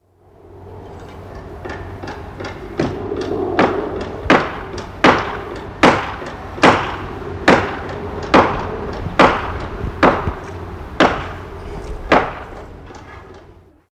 chantier ajustement arret momentane; Culture sonore

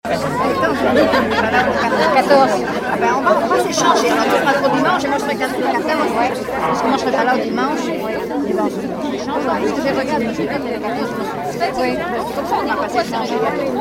metabole foule

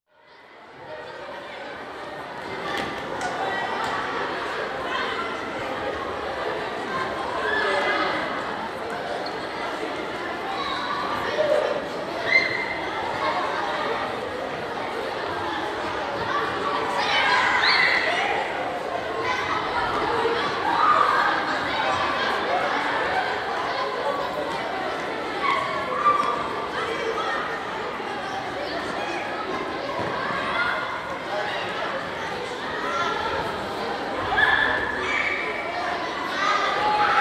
La recre en interieur; Metabole

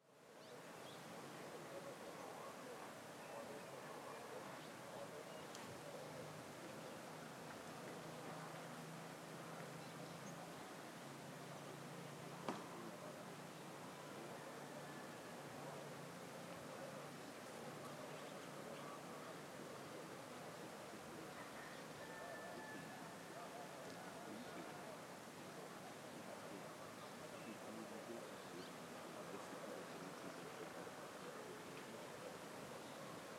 nefta desert; resonances oasiennes

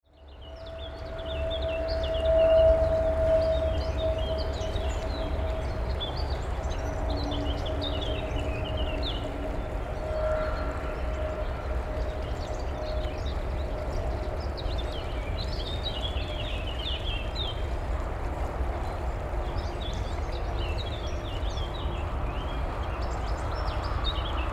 pont canal composition; cartophonie

